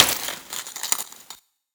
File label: hit, Processed Foley; 99Sounds; Sound Design Tools